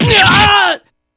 "argh!"; yu

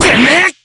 block; kurogane

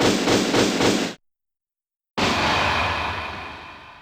investigation complete; aai

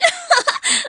chuckle; yukari

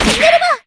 kick; celica; minerva kick

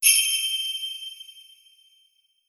pray; rayfa

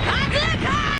bazooka

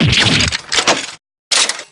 damage; drebber

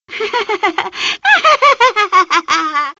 laugh; flora

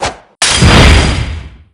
glass throw; barok

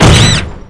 slam; dmitri

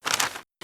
dismiss; inga

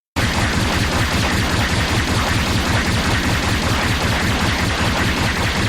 gatling

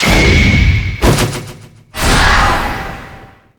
evil; tenma

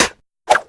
slash; blackquill

